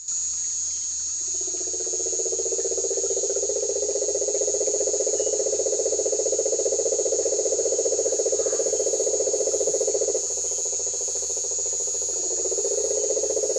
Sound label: marine toad rhinella marina; lyagushek i zhab